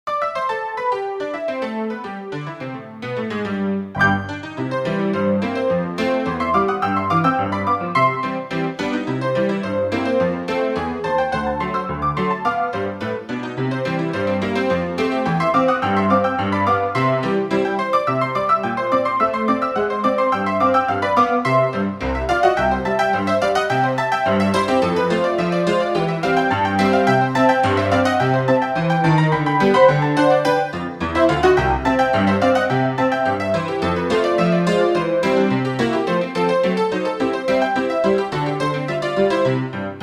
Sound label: player piano